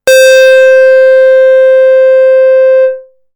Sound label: Basic Pulse, synthlead